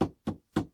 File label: Wood Pat